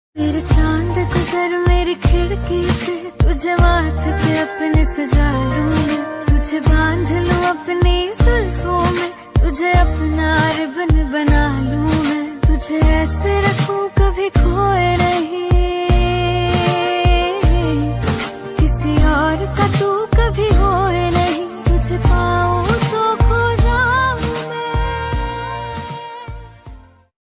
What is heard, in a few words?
Ringtone